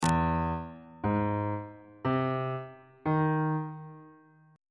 c sharp harmonic minor 7th chord 3 is e augmented major 7th on bass clef